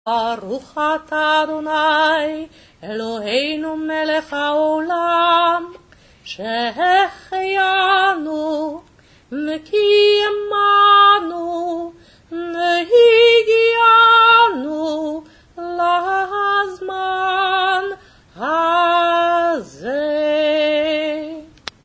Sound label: Shehecheyanu traditional; Shalosh Regalim arvit